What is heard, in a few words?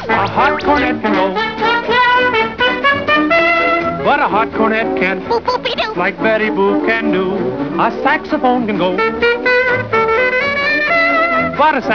sax